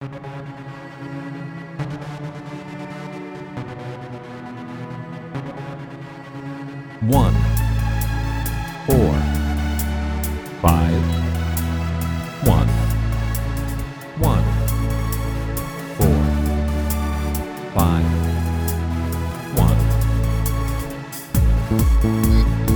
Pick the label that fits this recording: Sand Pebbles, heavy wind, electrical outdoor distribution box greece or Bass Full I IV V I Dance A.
Bass Full I IV V I Dance A